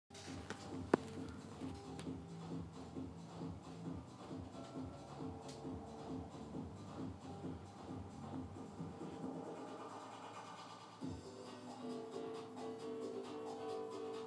morning noise pollution

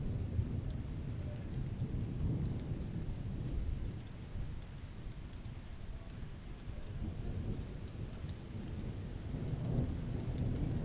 thunder storm

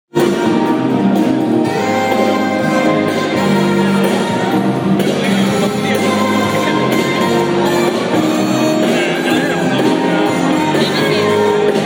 amazing band

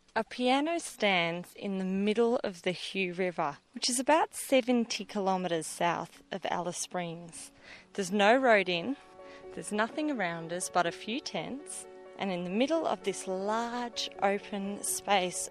desert piano

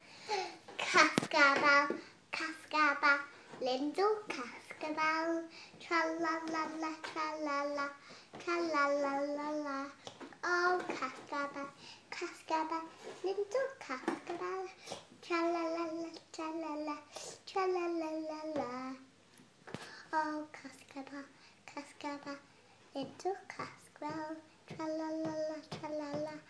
small girl sings cascabel jingle bells in spanish

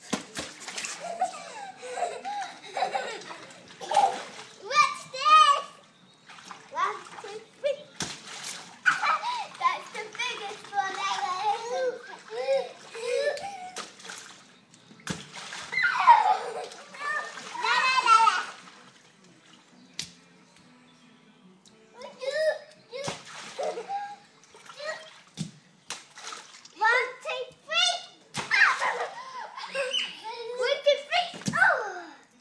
children in paddling pool

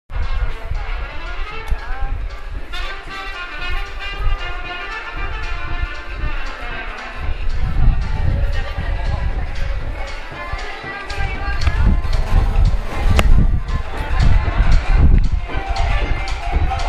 band under blackfriars bridge

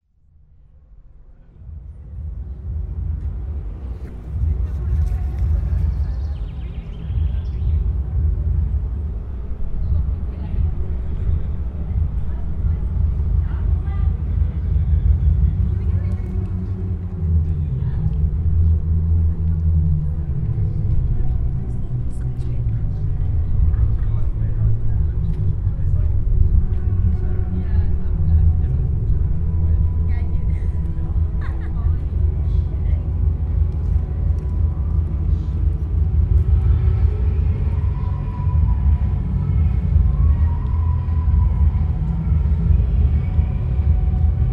seriously loud soundcheck in salisbury cathedral

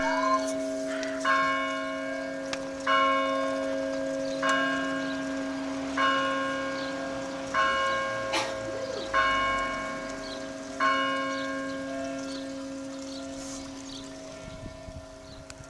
seven bells vevey

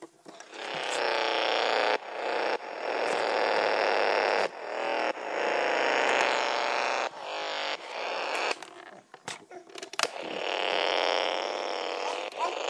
toy violin noise

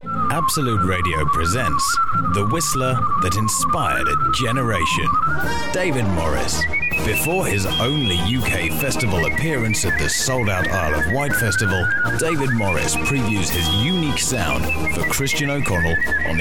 the whistler advert

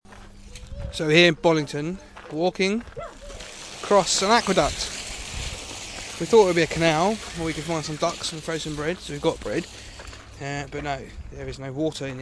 Bollington aqueduct

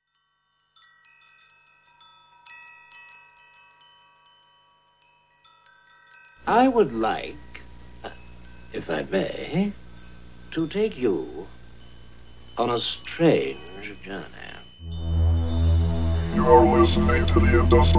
show jingle idea; industrial revolution

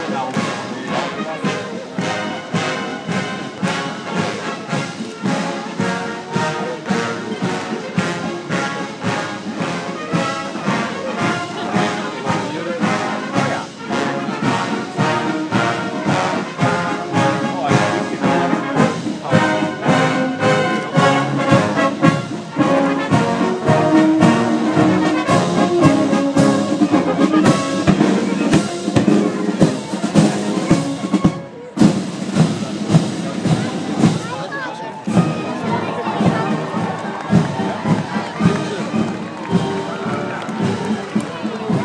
royal marching band

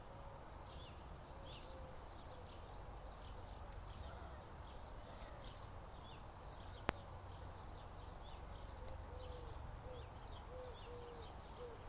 birdies

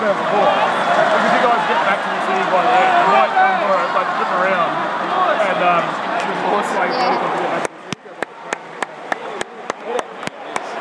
the aleague crowd remembers cheers; Dylan Tombides; West Ham

